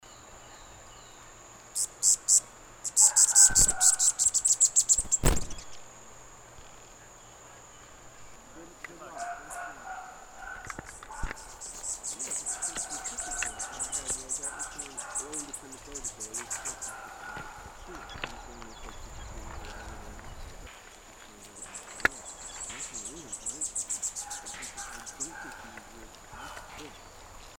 Yellow headed Warbler; Vinales

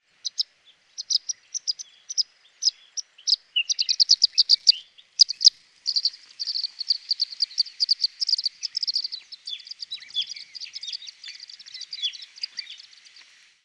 African Silverbill calls

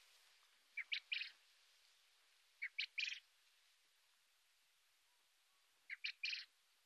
Japanese Quail

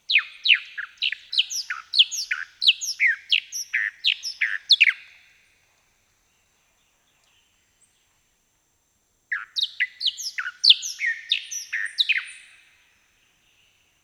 Bahama Mockingbird

